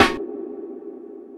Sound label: tight steel snare drum sample c sharp key